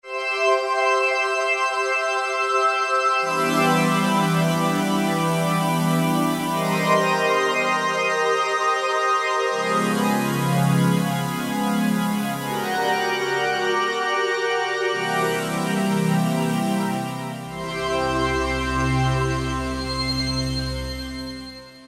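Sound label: Pad Sky Organ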